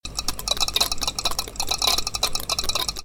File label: PopCorn Popping